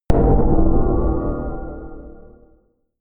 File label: sub wobble distort; hits impacts